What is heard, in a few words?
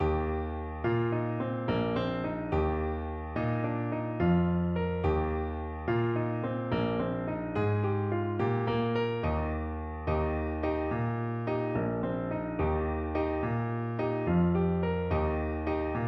jig, Saxophone